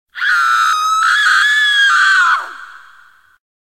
Horror Scream

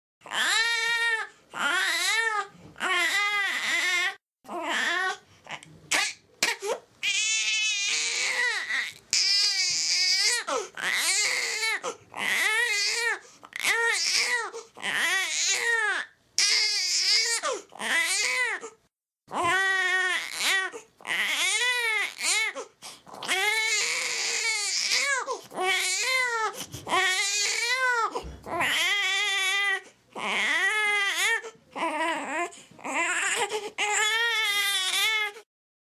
Baby cry sound effect long

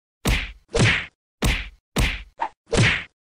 Fight Sound effect

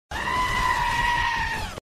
Goat scream; Goat scream sound effect; Abathur scream; Funny moments sound effect

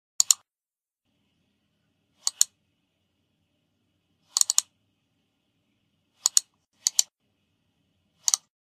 Mouse click sound effect

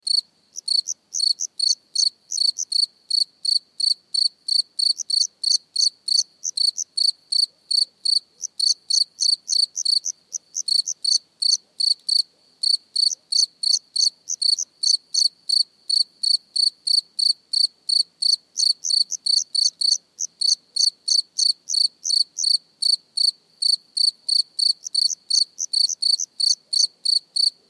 Cricket chirping close up

